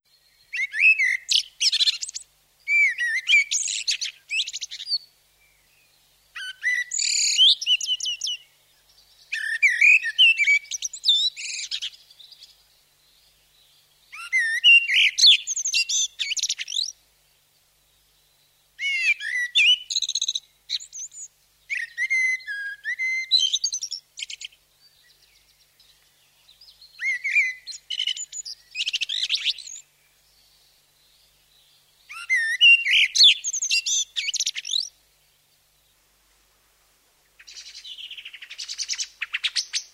amsel

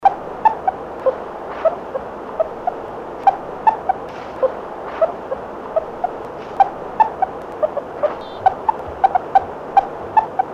kleine wiesel

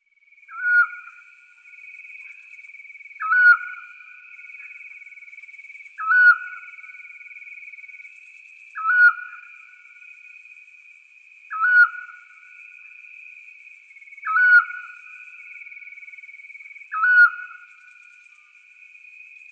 Zwergohreule

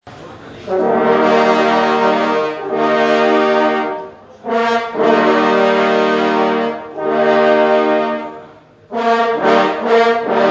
Totengedenken Halali Jagdhornblaeser

